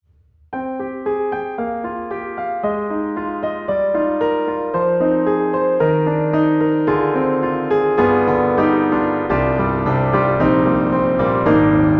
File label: D4 Trio; MODARTT S.A.S.; Pianoteq